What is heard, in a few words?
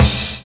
RIDE FILL; Univers Sons